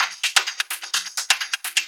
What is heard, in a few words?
FX Loop 128 BPM, House